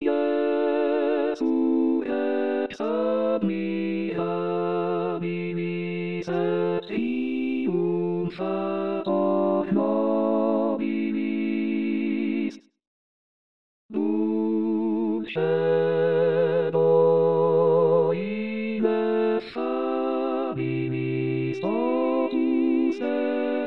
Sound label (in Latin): Jesu Rex Admirabilis Coro; Palestrina; anno liturgico